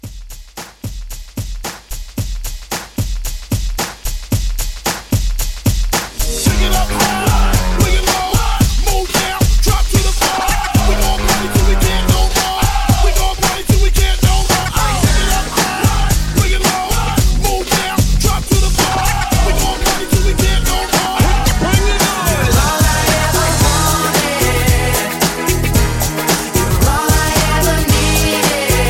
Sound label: 90s Hype Edit